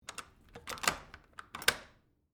Door Lock Hard Wood, Foley, Door, Lock, Hard, Wood, Front, Door; Two, Locks; Door Closing; BlastWave